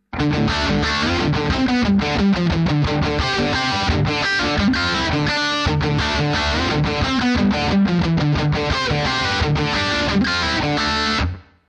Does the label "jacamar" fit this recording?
No